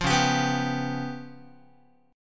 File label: Db 7th Flat 5th Guitar, Db7b5 chord; Db7b5 strummed; JGuitar